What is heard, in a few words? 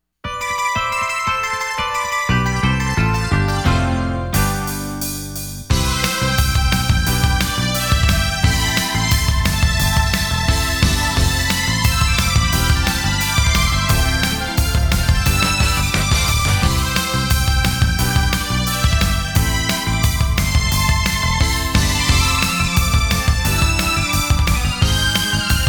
Metal Arrange Version (Story Mode Normal Level BGM); TwinBee Taisen Puzzle-dama featuring Meloura-hime; A Rainbow Colored Wind; Metal Yuhki Arrange Version